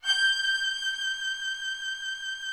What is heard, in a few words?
VIOLIN G5; VIOLIN+VIOLA; Greytsounds VOL-1